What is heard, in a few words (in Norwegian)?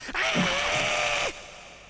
sniff knurrer; mummitrollet